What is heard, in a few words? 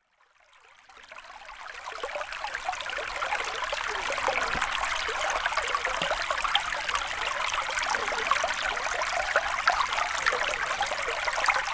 Creek